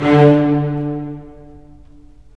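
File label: STR, strings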